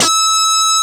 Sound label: G5 GUITAR; AKAI S6000 CD-ROM - Volume 1; JOHNS GT